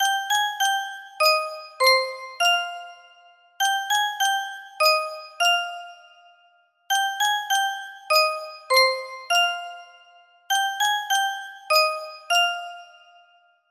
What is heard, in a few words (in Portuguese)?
caixinha de musica